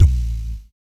VOC PERC, Vocal Planet Jazz FX BASS PERC

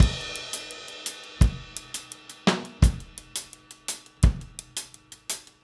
Drums, 70s Analog Funk

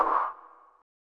scrub, Yamaha Tenori-On; Scrubkit

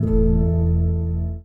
Gong Harp; Sonic Foundry (Sony Creative Software) - Friendly Fire; Single Gongs

